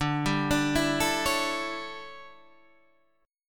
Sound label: D Major 7th Suspended 2nd Suspended 4th, chord, Guitar Standard; DM7sus2sus4; arpeggiated; JGuitar